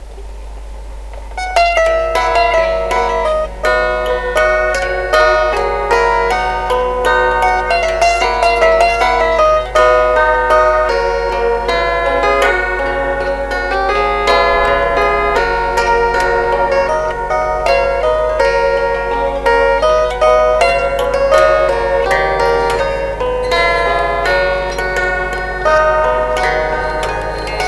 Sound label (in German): Chordephon